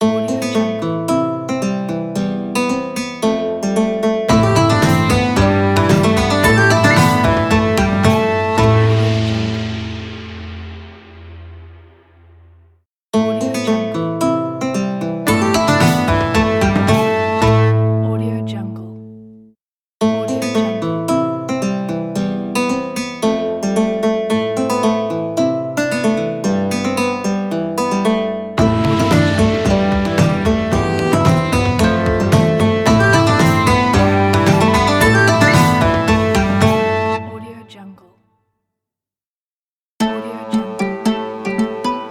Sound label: medieval adventure logo pack